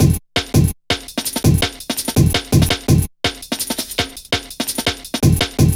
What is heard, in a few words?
Played, Drum Loops 166.5; Zero-G Jungle Warfare 1, 2 & 3